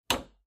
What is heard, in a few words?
hammer hit